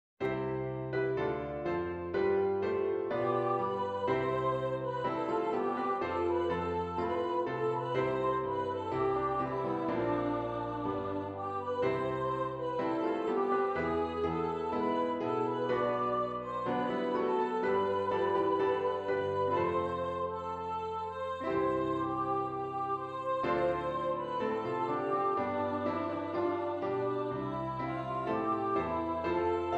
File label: The Blue and Gold PIANO